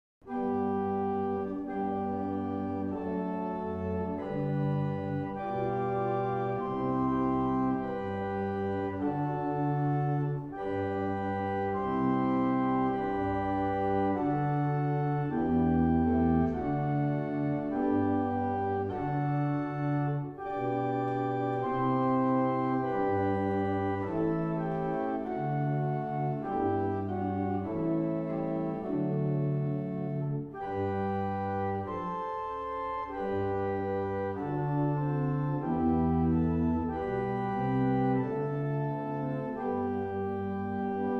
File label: barrel organs; Stanstead